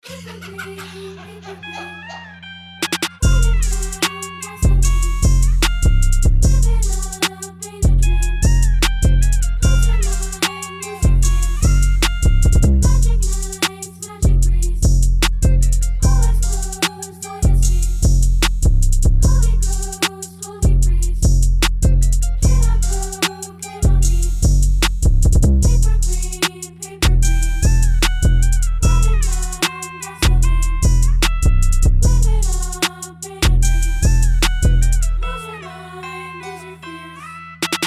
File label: INSTRUMENTAL